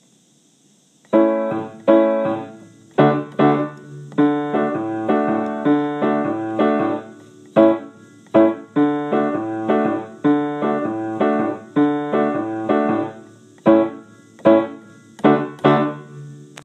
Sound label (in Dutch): lied kleuren karaoke versie